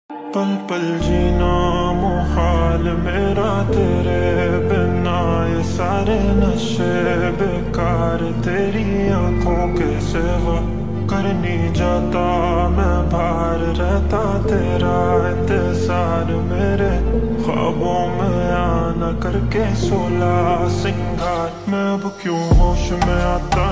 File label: Slowed Reverb